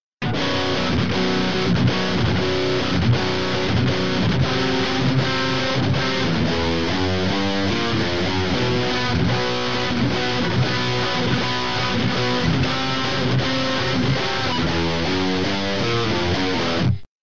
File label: riffadoodle